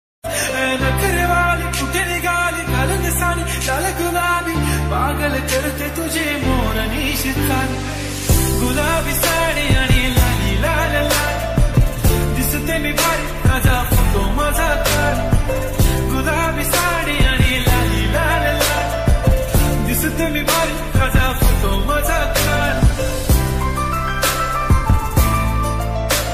ringtone song, Marathi song

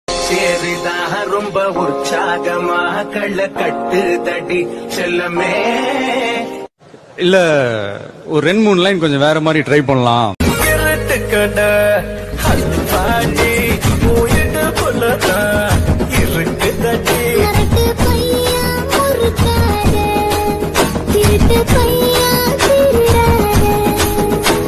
tamil dance kuthu

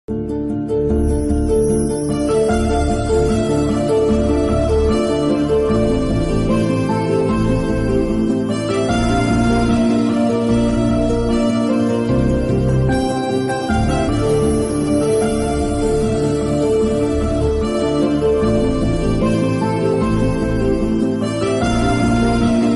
love bgm telugu love bgm ringtone; srimanthudu

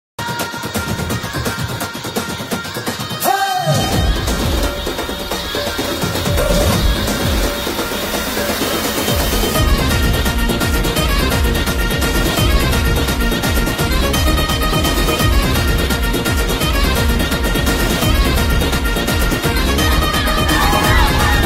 tamil song; rrr

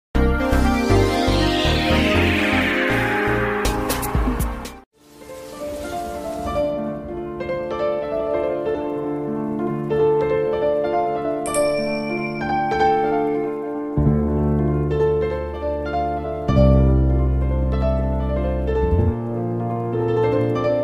telugu bgm music love bgm music; jalsa; pawankalyan; iliyana

